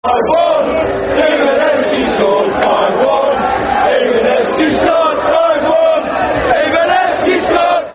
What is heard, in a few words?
Football Chant (Soccer Song), England; 5-1 Even Heskey Scored